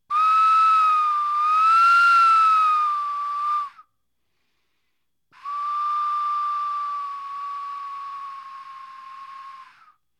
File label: solo